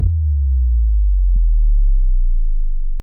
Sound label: 3 Second Drop